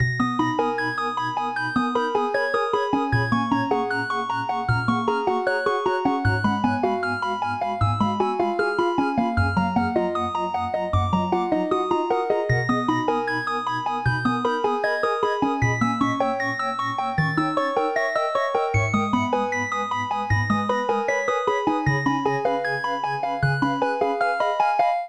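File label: keygen song.xm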